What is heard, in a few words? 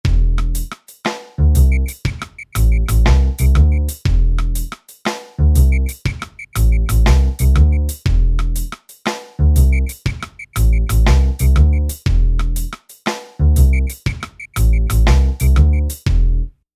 Reggae